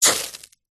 foley metal hot water drips into sizzle; zapsplat

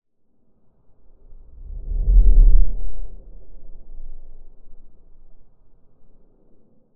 Whoosh Rumble; bottlerocket

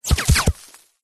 bullets x3 hit ground dirt quick succession; zapsplat

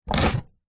foley wooden box case pick up from hard surface; zapsplat

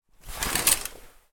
household curtains draw hotel

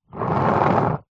sport skateboard plastic short skate close by

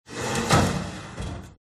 close drawer oven

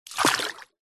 nature water impact light small; zapsplat

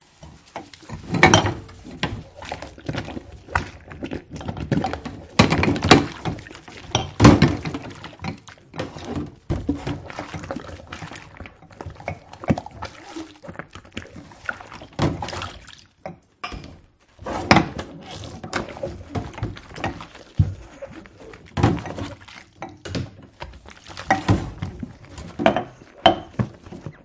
pub glass wash sink; field reports

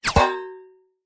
bullet hit metal; zapsplat

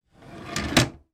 computer desk keyboard tray slide in; office

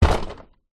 impacts plastic seat hit; zapsplat

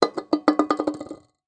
foley can beer empty down table wobble; zapsplat

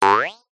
cartoon jump jaw harp

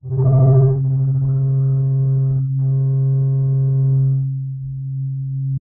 Swell Sci Fi Abstract Flutter Sustained Long; Skyes Audio

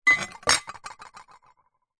trowel metal set down on tiled ground; industrial

